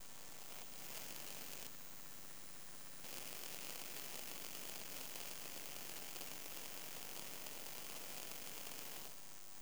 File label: Electromagnetic Phone Pole Sequence Mono; Essentials Series NOX SOUND